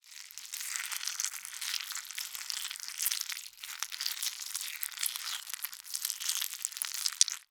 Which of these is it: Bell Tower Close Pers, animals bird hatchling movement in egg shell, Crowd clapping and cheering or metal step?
animals bird hatchling movement in egg shell